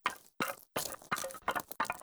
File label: Run Footsteps Bones; Hero